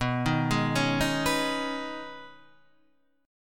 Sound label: B 6th Add 9th B Guitar Open D, chord